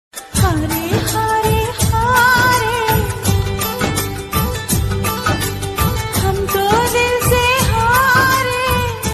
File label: Female version